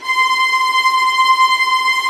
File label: VIOLINT D, Synclavier Strings; ILIO